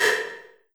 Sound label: 808, 808 construct; Masterbits - TR-Minator